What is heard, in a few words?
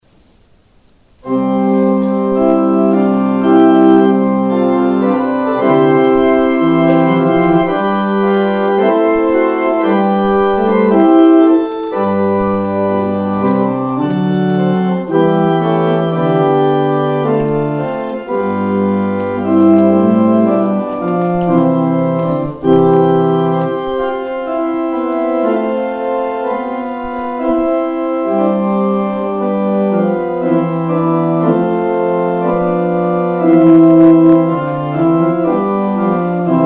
organ outro; Organ exit music